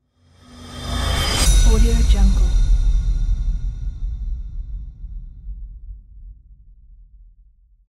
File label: metal swoosh